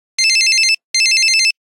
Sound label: Ring ring, Nokia Buzzer; Windows Phone apps